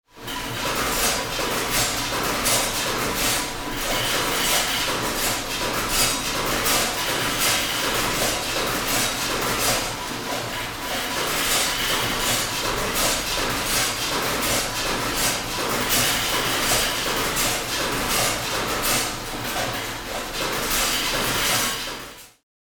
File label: candies filling machine